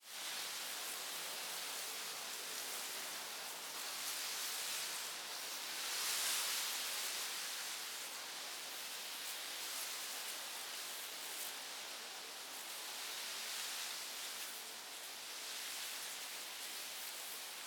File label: plants swaying